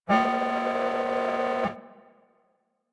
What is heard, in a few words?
Animal Foundry Working; Tenno; Warframe; Liset; Hub